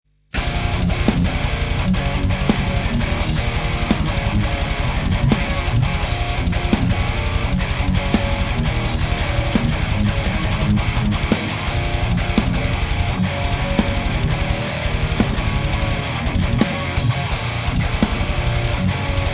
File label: 6L6; MagoDIRevv4; g12k100